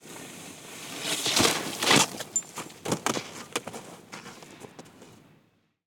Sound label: ski skiing downhill fall falling; sonniss gdc